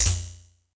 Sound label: Bug